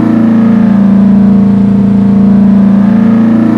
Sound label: low, engines; SUBARU